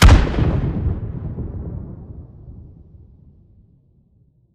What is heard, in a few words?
Nuke Destruct Explo Mid; AI Stalker; Titanfall 2